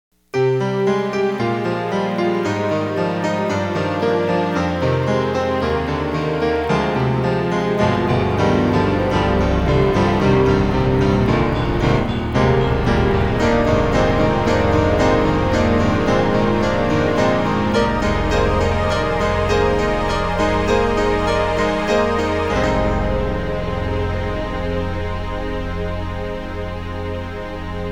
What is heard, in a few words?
E-MU UltraProteus; Heaven